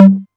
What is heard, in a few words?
808 Tom Hi, Novation Drumstation